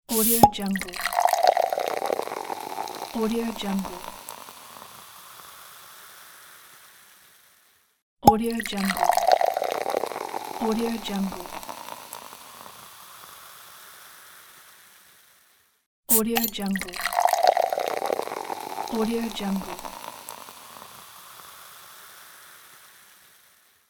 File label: bottle cork and pour